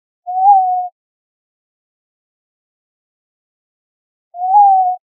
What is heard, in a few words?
vermiculated, owl